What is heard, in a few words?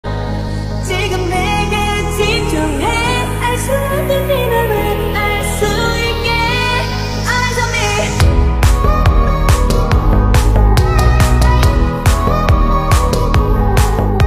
mixed ringtone